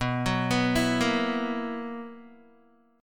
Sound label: B Major 7th Suspended 4th B down Guitar; arpeggiated